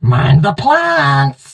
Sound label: hurt